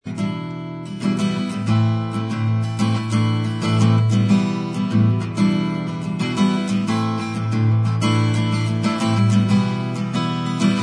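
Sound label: acoustic instrumental track, backing tracks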